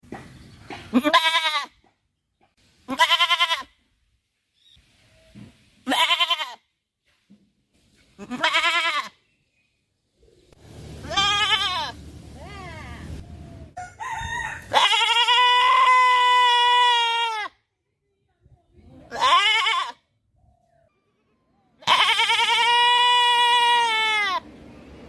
Happy Goat Sounds Funny Bleats

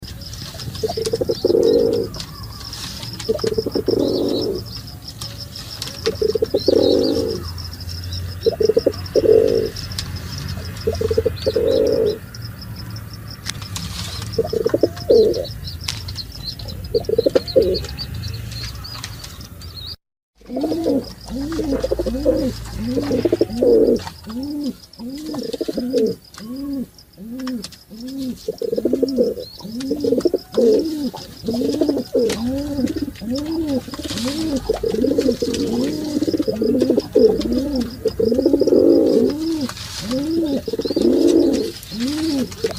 Pigeon Sound

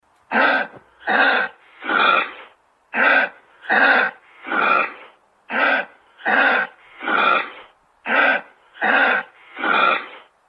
Kangaroo Sound

